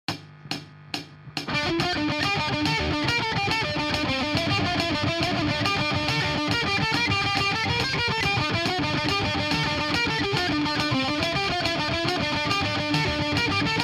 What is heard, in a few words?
high gain lead